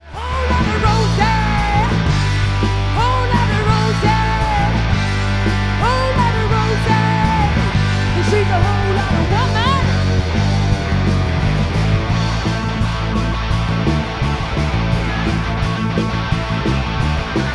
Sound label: hell yeah rock is nice